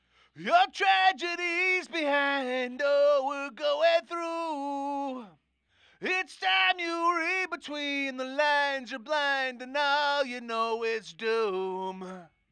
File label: Verses, Vocal bounces